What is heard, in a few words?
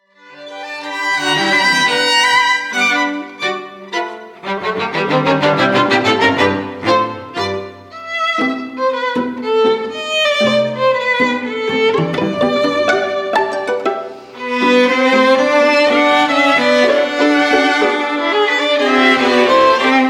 Quartet, Strings; The Leos Janacek Quartet